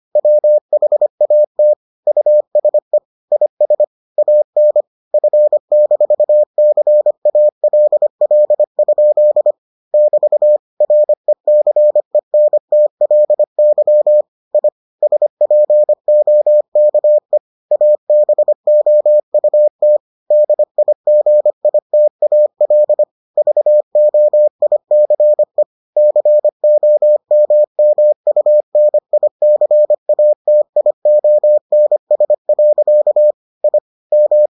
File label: morse